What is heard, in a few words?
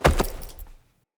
Foley Action Default Body Fall, Default Clothing; Player